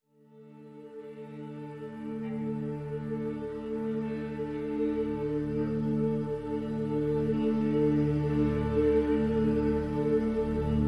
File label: Pads Only